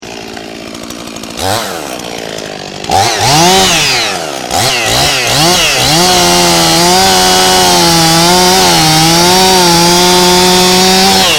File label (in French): scie mecanique